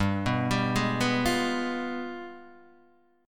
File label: G 7th Flat 9th, Guitar, Open D